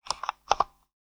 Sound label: Taxi Meter